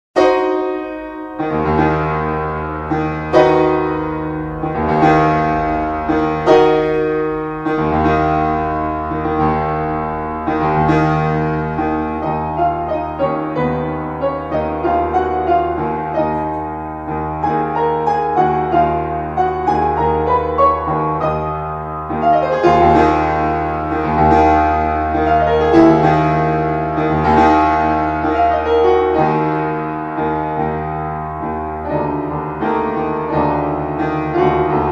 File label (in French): PRELUDE EN MI BEMOL MINEUR